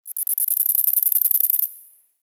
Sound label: Tiny Creature; Ambience; Dungeons; Under City